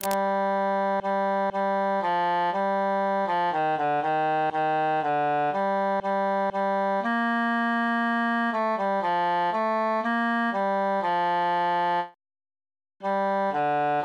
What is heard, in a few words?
bassus solo